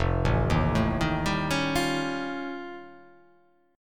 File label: F Augmented 9th F, chord